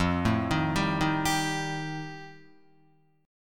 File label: F 9th F Guitar, chord; arpeggiated